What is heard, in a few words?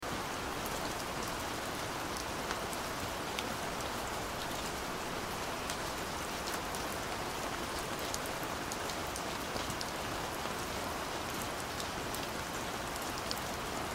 Rain; OneStepToHellMOD